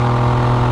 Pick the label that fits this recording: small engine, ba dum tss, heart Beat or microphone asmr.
small engine